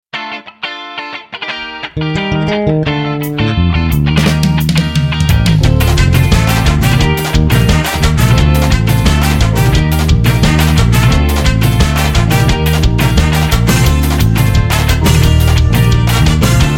frevo

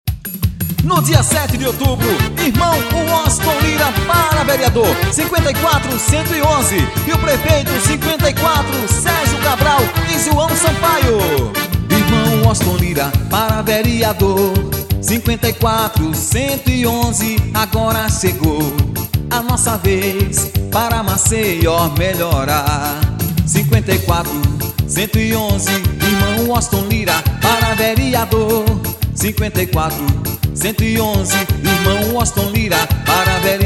playback instrumental; 2012 vereador

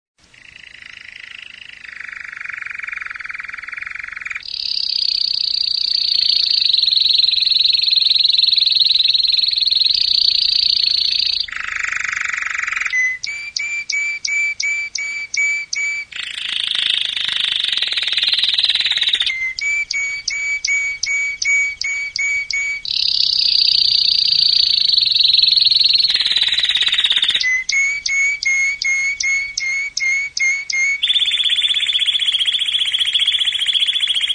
canario belga; campainha; salvio max colecao

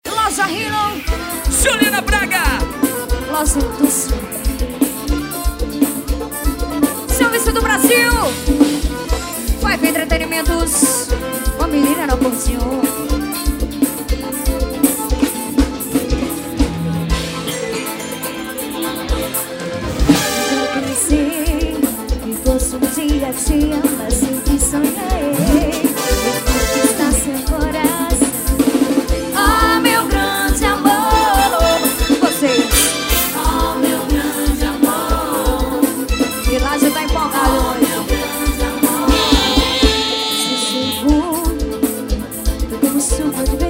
ao vivo no bar

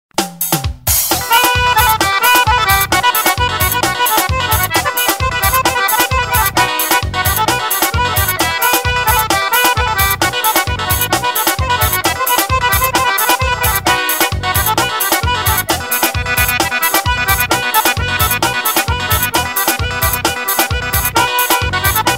forro solado

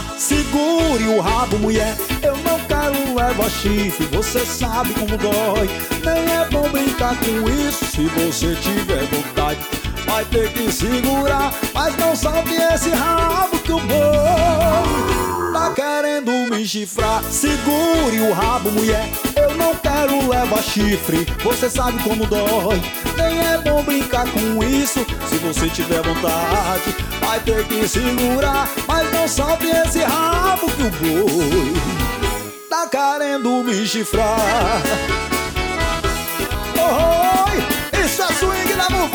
toque celular

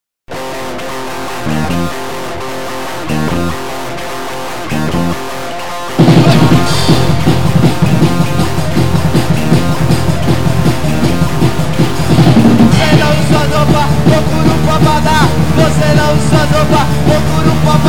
camisa de abadá

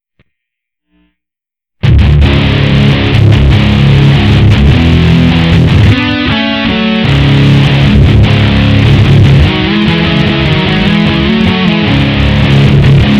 guitarras

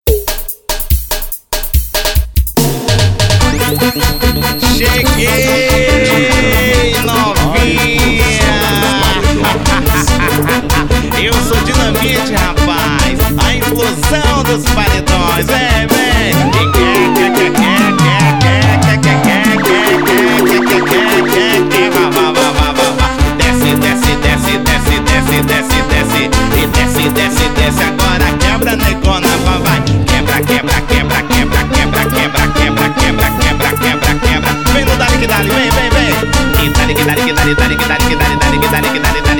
abertura

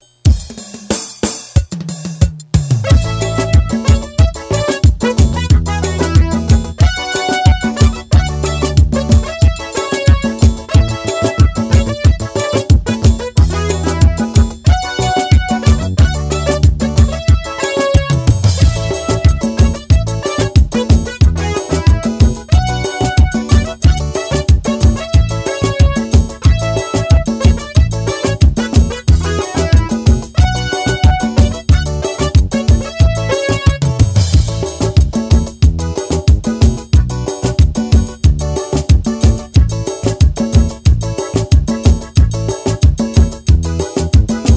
batida louca

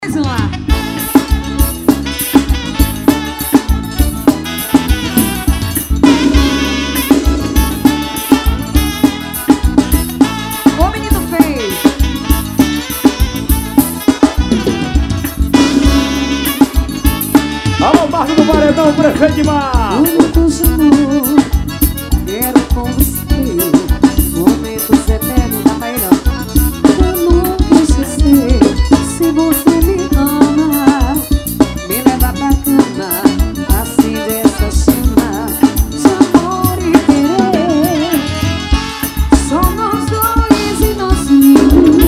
forro das antigas

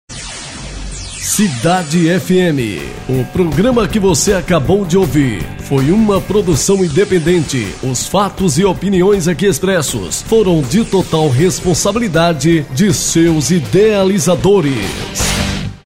vinheta final de programa independente

